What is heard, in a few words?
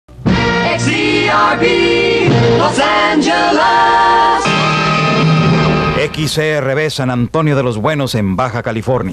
jingle